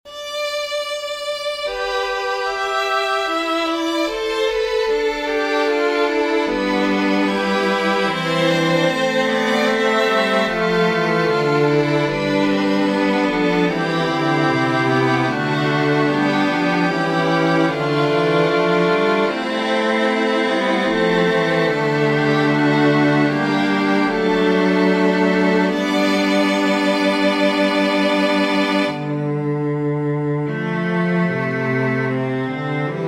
for string quartet; bononcini giovanni; musico prattico

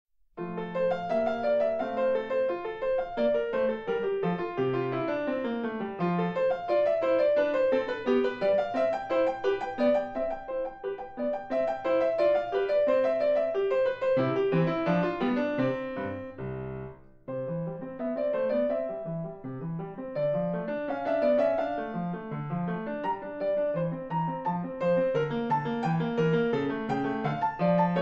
allegro

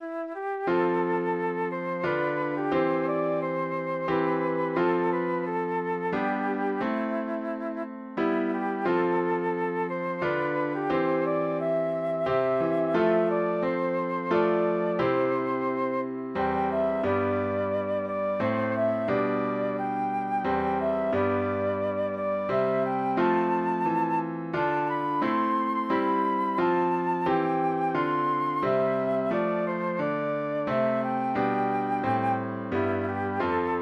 for flute piano; holst gustav; i vow to thee my lord

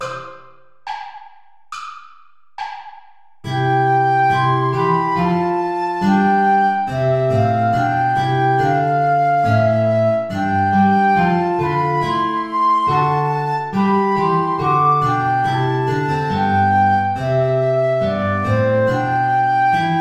play along without guitar

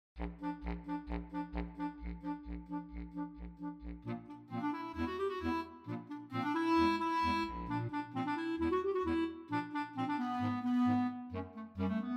allegretto from the first piano suite; Bela Bartok

